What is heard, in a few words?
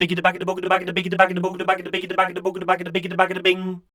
SCAT #F, Voice